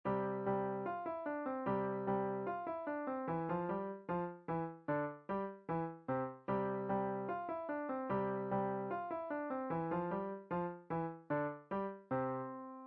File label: Bach musette, piano; easy